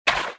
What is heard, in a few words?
water run, footsteps